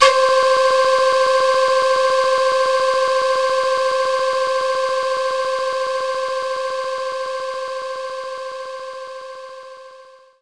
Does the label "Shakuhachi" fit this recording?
Yes